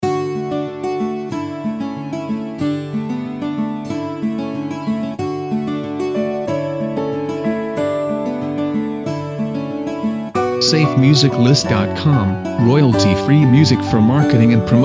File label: Romantic Wedding Acoustic Loop